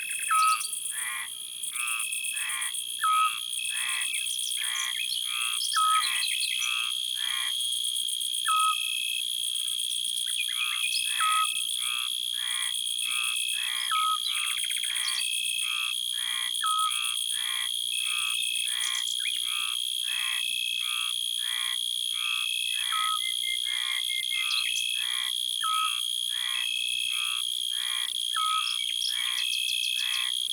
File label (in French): Courtiliere; ABC de la nature